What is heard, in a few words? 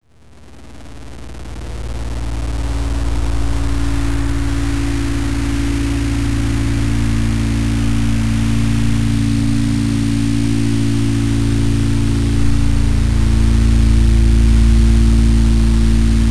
Low White Fuzzworld; Satellite Hive